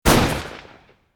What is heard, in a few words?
Bullet Time; FXHome; Bullet and Gun